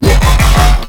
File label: 175bpm Laughing Bass